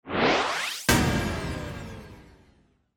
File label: Ascend Descend